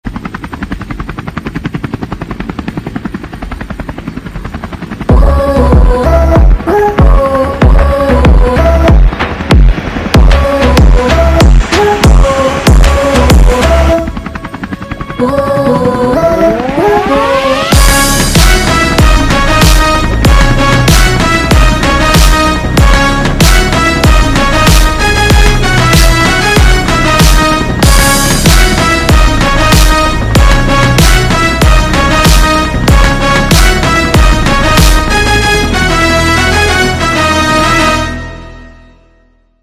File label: climax helicopter entry bgm; Vettaiyan; Rajinikanth